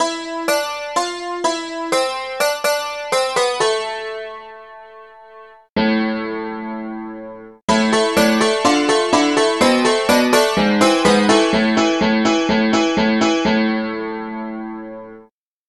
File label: dulcimer